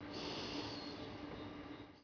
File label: sleep female; hotel giant demo